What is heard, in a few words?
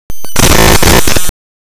glitch